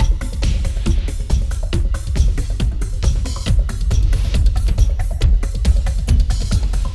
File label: Clubbin' 138